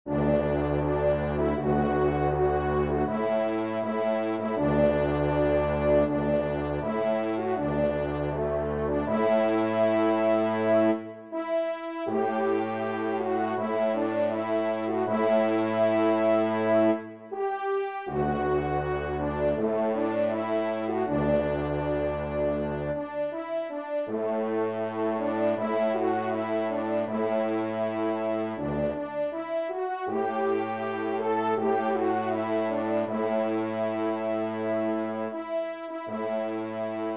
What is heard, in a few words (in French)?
Hymne a Saint Hubert